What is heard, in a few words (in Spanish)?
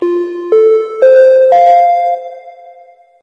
alerta de aeropuerto; tono de llamada